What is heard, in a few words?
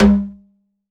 RACK TOM, ELECTRO KIT